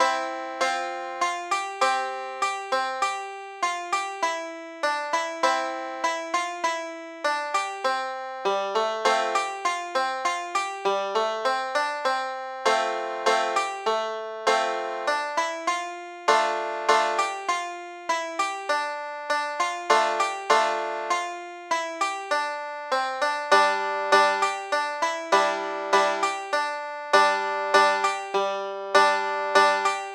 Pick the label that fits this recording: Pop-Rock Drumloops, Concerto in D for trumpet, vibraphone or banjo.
banjo